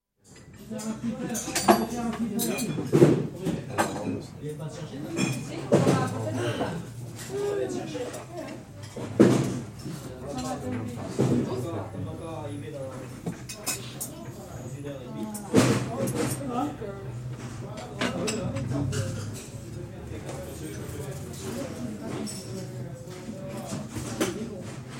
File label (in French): ambiance café